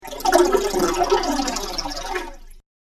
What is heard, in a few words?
Starship Water Cooler; science fiction sound effect; Andrew Fleming